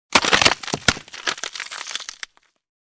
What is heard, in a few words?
crack, Medieval Weapons Sound Effects